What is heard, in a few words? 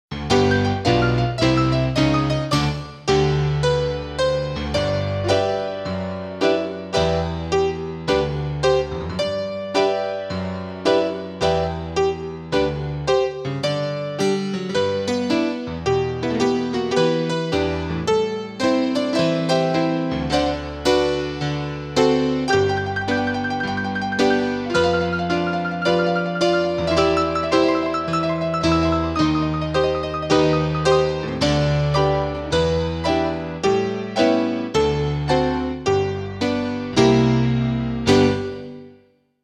Accompaniment; When The Saints Go Marching In